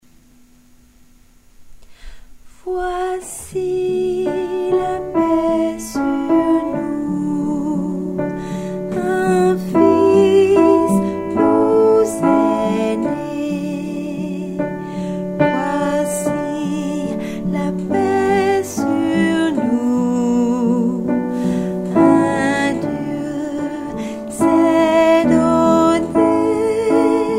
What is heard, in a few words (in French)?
basse